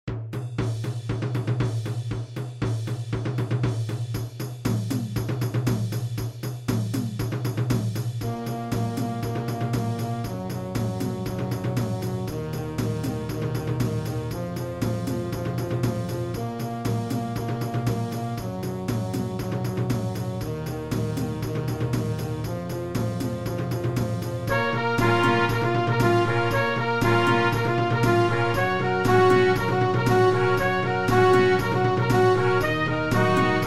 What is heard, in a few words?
Disco system band; midi